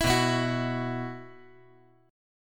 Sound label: B Suspended 4th